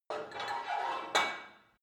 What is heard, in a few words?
put weight onto barbell quick, fitnessstudio